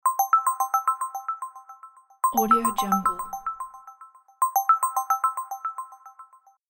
magic ringtone

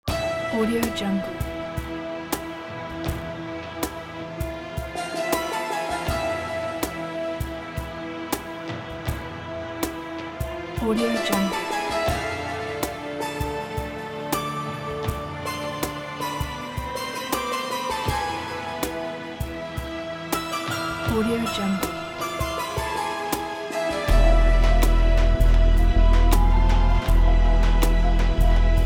arabic

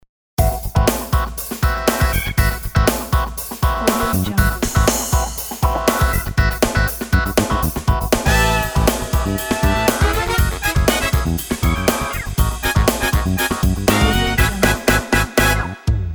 jazz funk beat

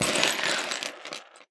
wipe handful of screws on ground; esmteam

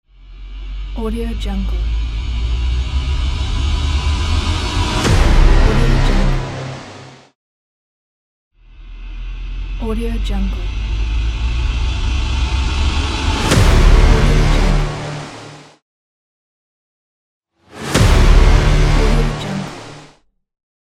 cinematic impact; greenwaysound

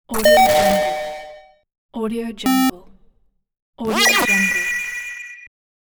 8 bit vintage

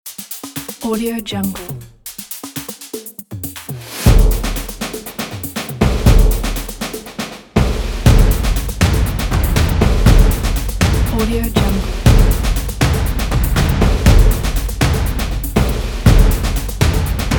drums; showreel

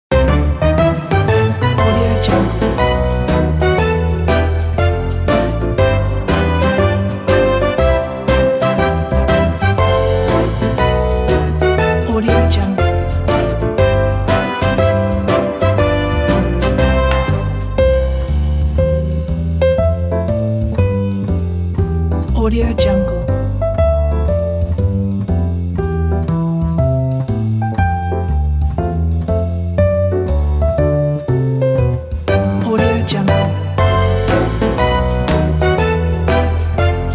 wedding march

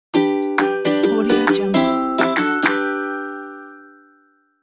kids simple logo

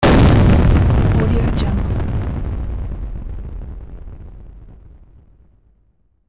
transformational distortion of the explosion; soundmasterfx

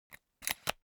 object umbrella telescopic retract

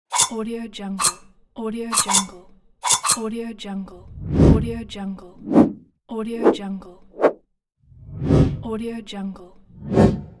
infographic sound effects

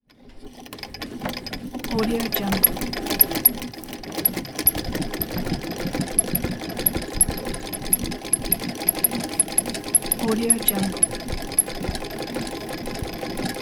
sewing machine

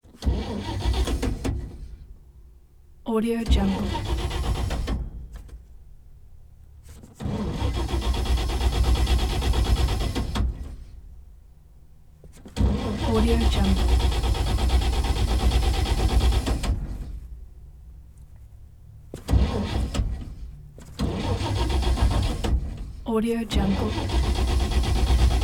1942 chevy car; prosoundfx

